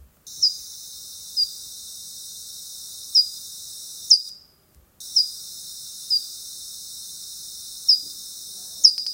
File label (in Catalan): pipistrella nana, sons ratpenats; ratpenats plafons